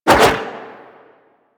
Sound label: Golden Band Staff Spin Whoosh B; Warframe; Monkey King